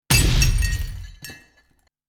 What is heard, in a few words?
sms iringtone crash with glass